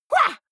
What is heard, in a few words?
goblin fight shout attack short, comedy cartoon vocals; soundpool